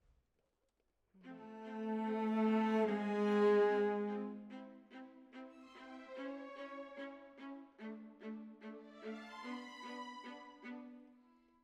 Cellos

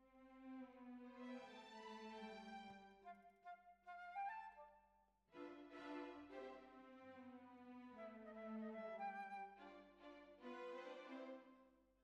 Bassoon, Jazz for piano

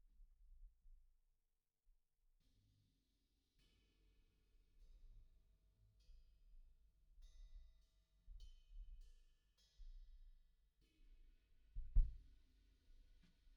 Kick In

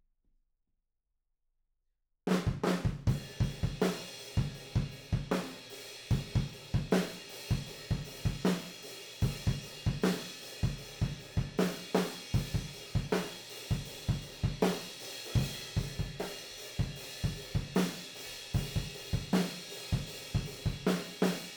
ROOM

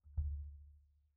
Kick SUB

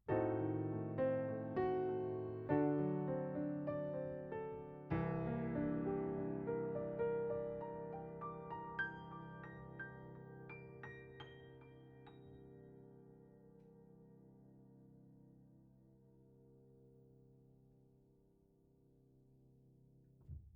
Piano A

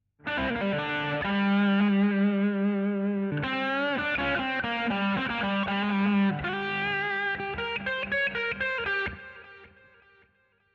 GTR B Solo

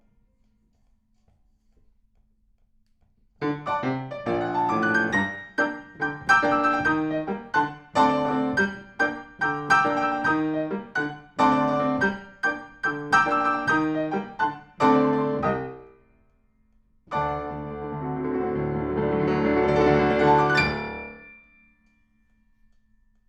PIANO

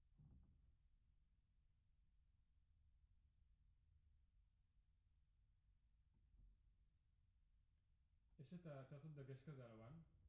Piano Mono; Piano Workshop Rec

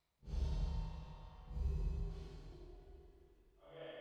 480 Rev

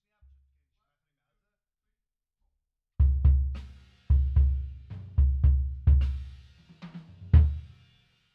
BD In

